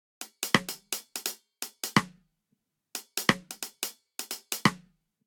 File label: Swing Reggae Snare & Hihat 88 bpm; 4 4; MIDI Clips; Ableton; Factory Packs; Drum Booth